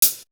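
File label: Hi-hat; Drum One Shots; Coldcut's Kleptomania VOL1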